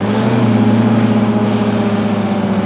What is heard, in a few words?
Diesel Truck By